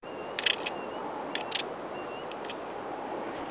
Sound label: at marsh